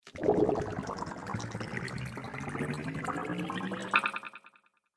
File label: Water Space Bubbles, sci fi; Splish, Splash, Splosh!; Andrew Fleming